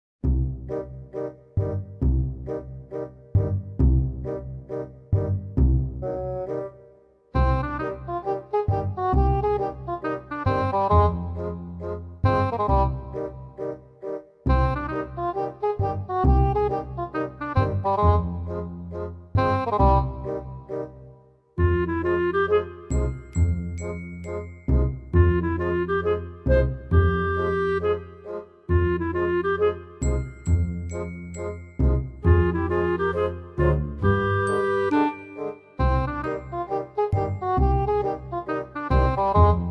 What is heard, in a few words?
lobby music